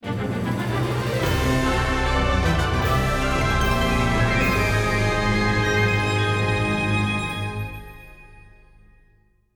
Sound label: victory theme; Zodiac Orcanon Oddysey